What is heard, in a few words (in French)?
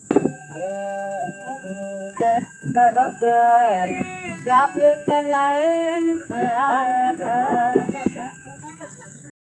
en inde la nuit dans, village